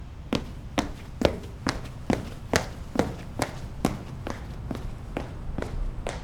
Stomping With Boots On Hard Surface

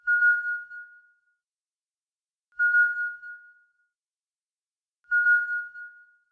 Motion Tracker Beep

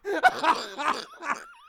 Laughs

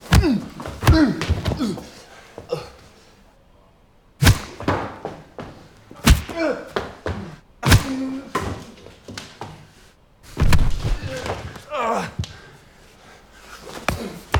Fight Scene

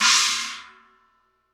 China Oriental Trash 18 Choke

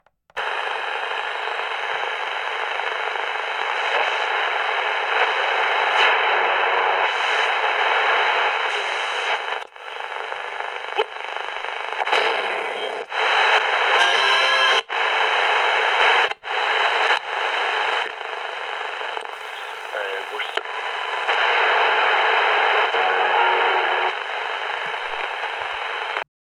Radio Changing Channels

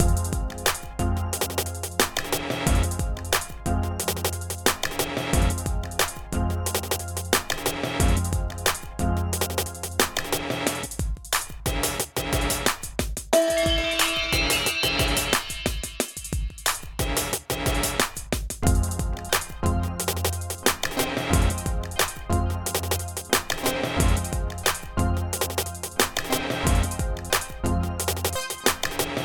Charming Loop

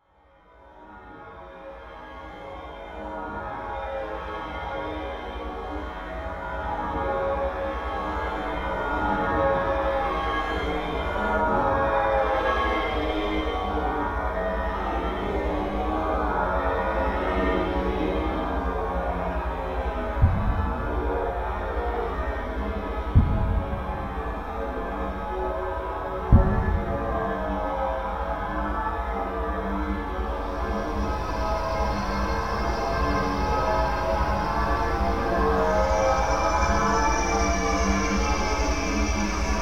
Horror Inside

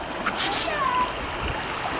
Loop

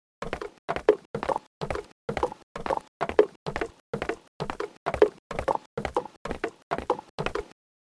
Cartoon Horse

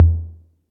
Dust 808 tom low

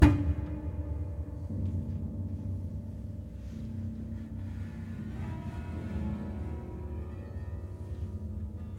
Mute Stab

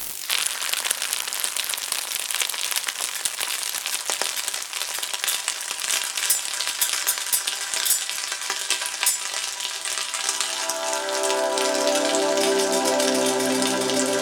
Deep Fried

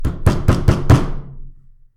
Boom boom boom boom boom

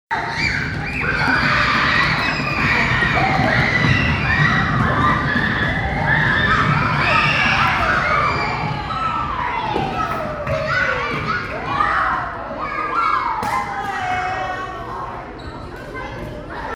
Children Screaming Running

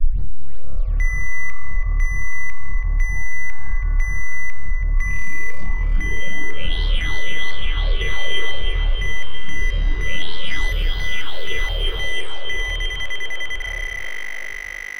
Time Machine

